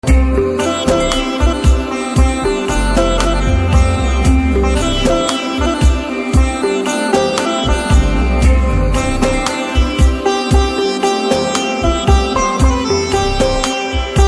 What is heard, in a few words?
BGM Instrumental Ringtone; Valimai